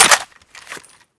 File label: clip out, weapons; M9K Heavy